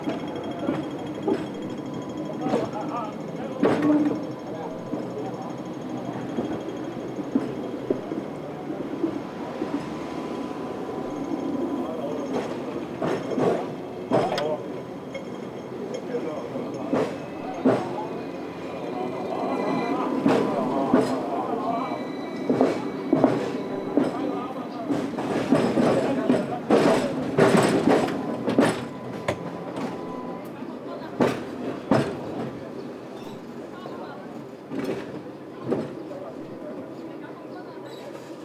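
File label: Tram On Road, Hong Kong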